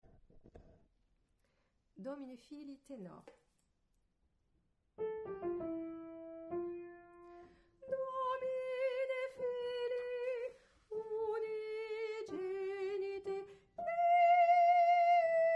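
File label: Tenor, Vivaldi - Gloria - Domine Fili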